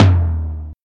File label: ambient tom one shot e key, Royality free tom drum tuned to the E note. Loudest frequency: 404Hz; tom drum samples